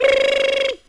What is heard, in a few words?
brrrrrr police whistle; ooh ahh